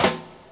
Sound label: SD, HIP, Dance Mega Drums